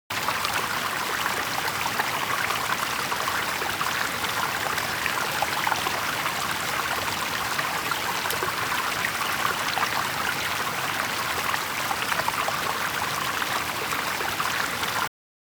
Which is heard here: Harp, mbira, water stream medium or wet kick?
water stream medium